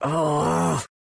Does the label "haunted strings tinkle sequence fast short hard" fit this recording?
No